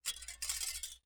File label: Metal; TOOLKIT vol.2